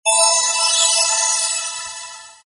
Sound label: magic spell tone